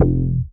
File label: DM BAS, Dance Mega Synth; Best Service